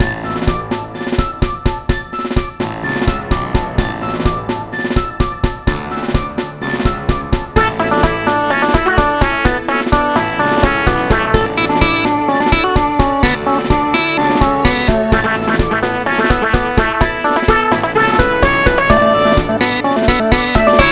Salute; Friday Night Funkin' Happy Tree Funkers OST

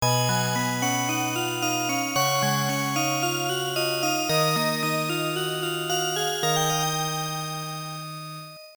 Game Over; final soldier turbografx 16

